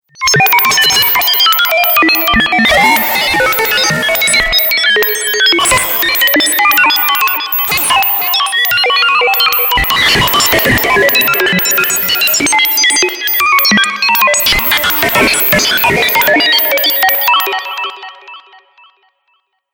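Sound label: Science fiction Data Stream Bleeps And Interference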